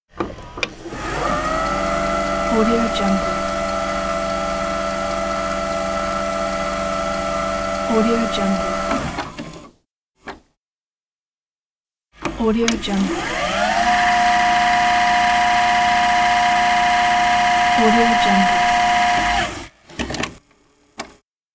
vhs tape rewind

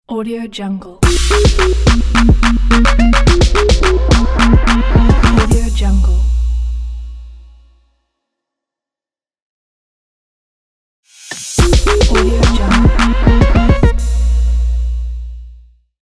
trap logo

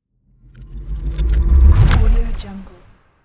teleport

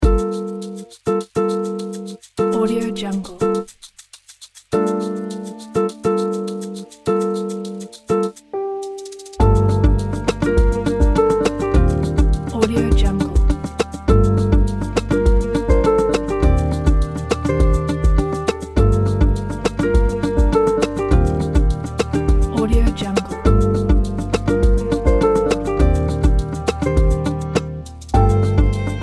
coffee relax bossa nova

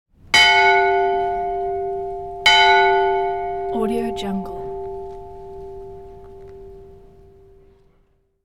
church bell ring

